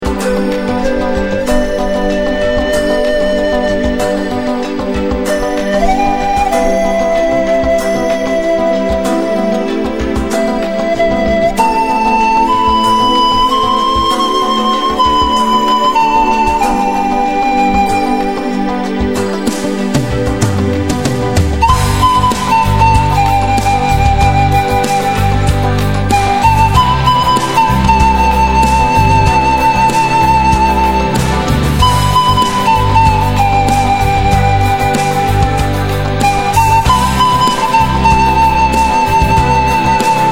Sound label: sting